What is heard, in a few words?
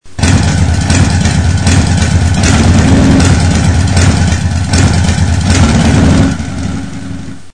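car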